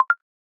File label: Button Click; boom karts multiplayer kart racing android